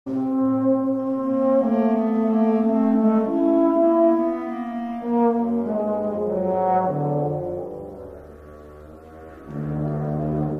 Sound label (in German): ensemble, kontrabassposaune